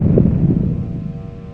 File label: thumper top; hl2